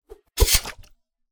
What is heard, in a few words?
spear, weapon attacks; psfx